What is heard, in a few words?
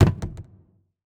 phys cube lg; Portal 2